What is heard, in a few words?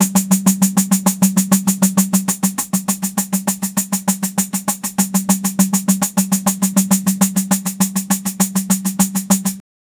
brazilian samba groove, samba